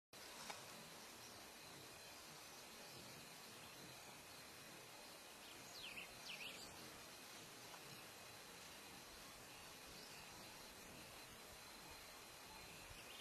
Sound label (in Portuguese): Canto do bico reto azul